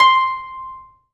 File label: STR HARP, STR Orchestral